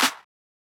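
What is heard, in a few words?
Clap Snare, Claps; SSO Claps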